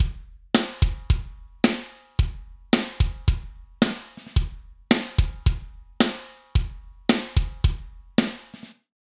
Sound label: kick snare mix